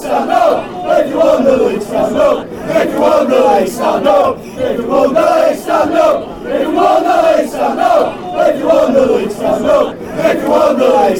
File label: stand up if you won the league, fanchants